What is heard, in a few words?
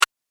Click Sound